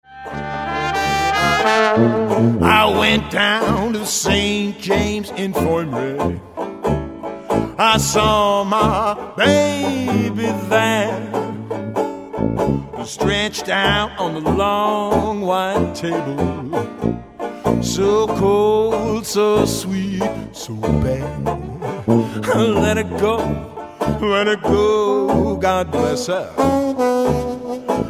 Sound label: Dixieland Jazz Band; 20s band